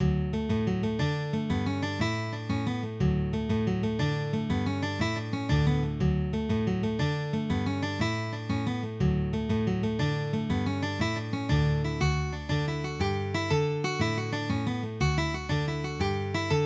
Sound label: Slip Jig; Hunting the Hare